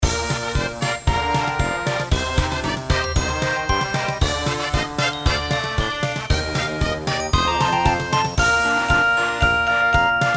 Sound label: in Bb, Eifonijs